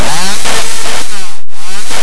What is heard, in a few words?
strech cow